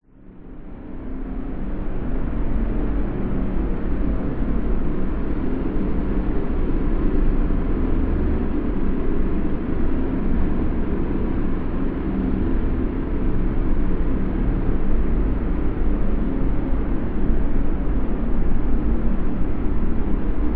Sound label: science fction forcefield energy source dark sinister slowly pulsating